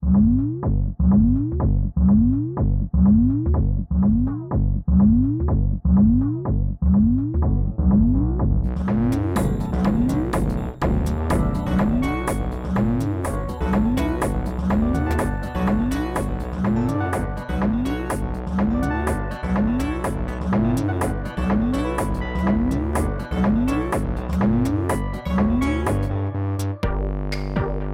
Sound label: ice music; Adventure Time - Elemental; ThrillGames